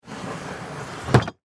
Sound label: Work Bench Drawer, Close; Wood (Sound Effects:Materials); BlastWave